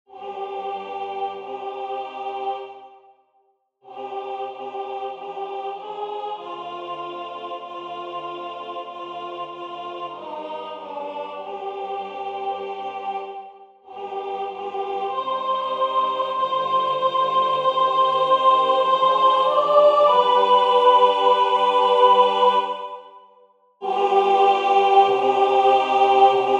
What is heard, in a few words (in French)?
soprane; Je ne suis pas digne